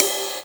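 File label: Cymbal, Yamaha DD-5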